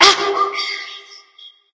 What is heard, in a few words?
grunt attack; smite; hel